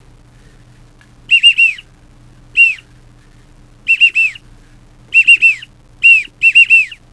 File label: Widgeon Whistle; duck